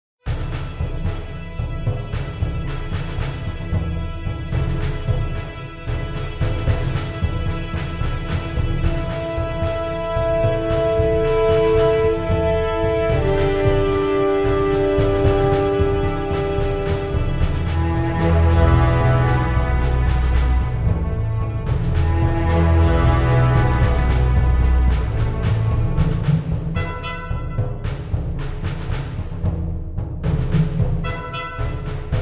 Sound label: the drums of war